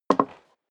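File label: step high heels wood creak single stair up, footsteps; schritte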